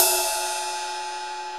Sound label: RIDE, DrumBase5000; Crypton; CFZ KIT 2